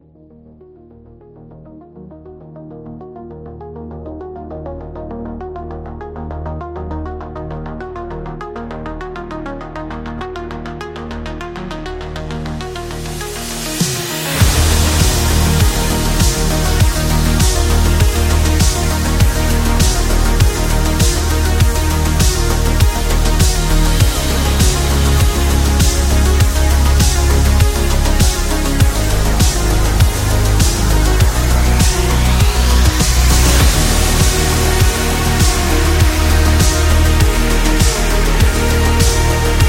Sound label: Retro Background